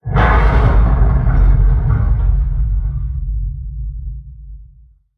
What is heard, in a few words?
Structure Blunt; Barotrauma; Room FX